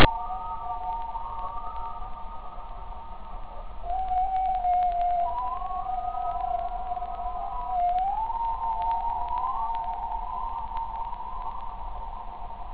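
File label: WHALE whistle; ANIMALS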